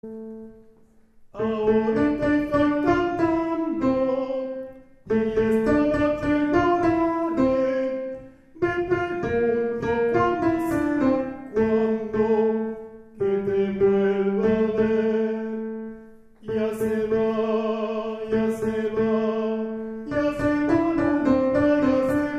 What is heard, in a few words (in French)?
Tenors